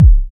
sharp kick drum single hit d key, kick sample tuned to the D note